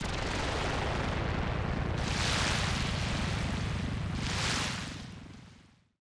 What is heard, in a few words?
portal, ambient